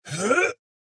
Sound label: Charge